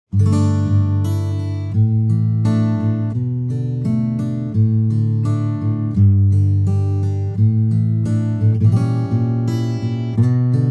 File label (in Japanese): 生音 指弾き